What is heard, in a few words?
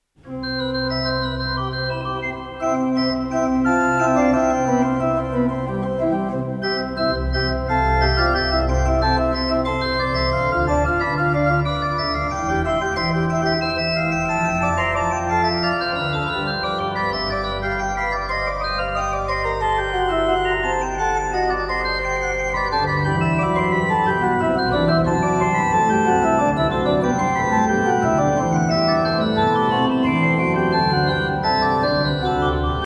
organ